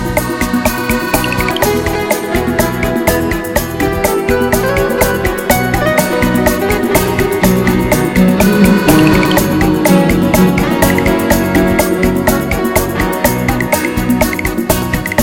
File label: Hot Bachata